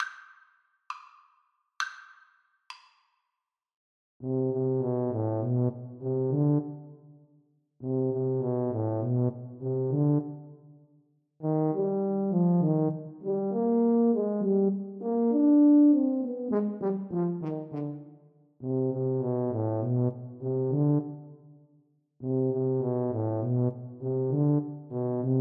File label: Tuba Duet